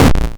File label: cutscene explosion; Rocket-Bytes